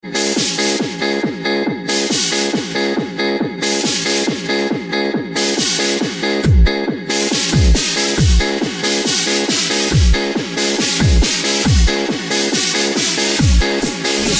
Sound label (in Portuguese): som automotivo